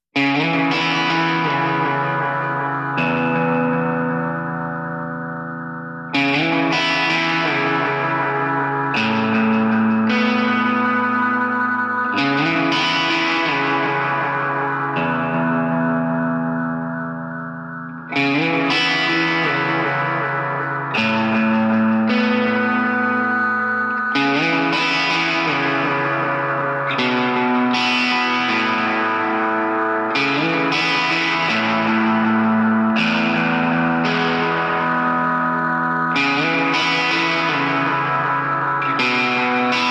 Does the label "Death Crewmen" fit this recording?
No